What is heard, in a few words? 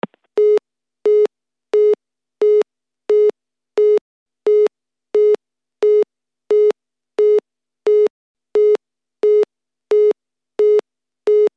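busy, short beeps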